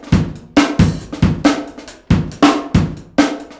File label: ACOUSTIC, Big Beat Drumloops